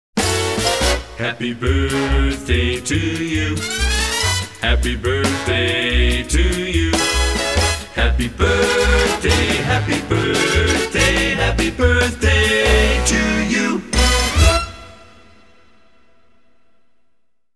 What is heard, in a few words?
Happy Birthday To You; Pams